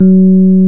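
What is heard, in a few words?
piano Rhodes Mk.V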